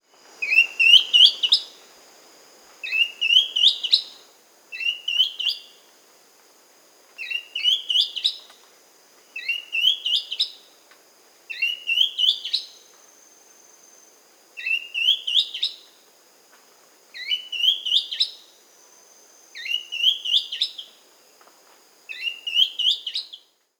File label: Gray-Throated Leaftosser (Sclerurus Albigularis), Andean Birds; S. America